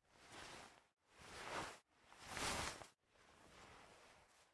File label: Player Return; MiSide